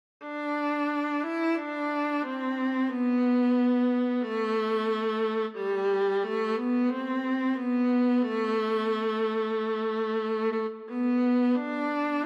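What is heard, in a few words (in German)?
solo Bratsche; Alle Jahre wieder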